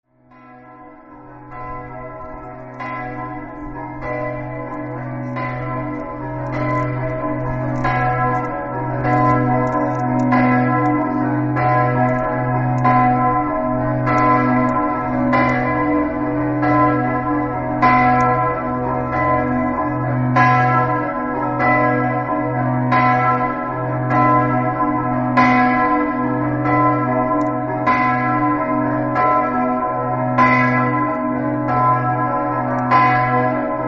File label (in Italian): campane; sedegliano